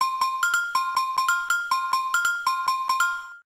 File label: Agogo; Fujitsu F-42A